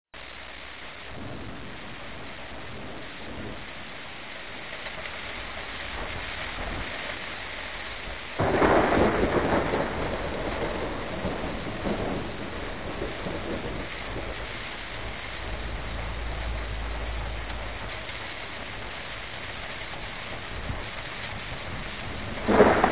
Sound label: wind